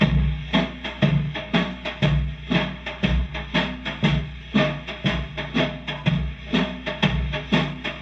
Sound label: Loop, rhythmate, classic machines